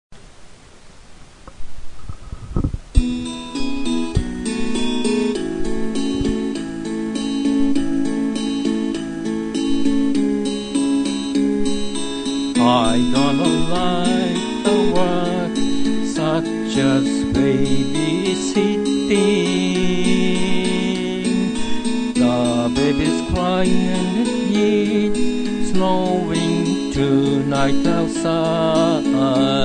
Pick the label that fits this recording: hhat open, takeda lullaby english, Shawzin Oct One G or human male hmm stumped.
takeda lullaby english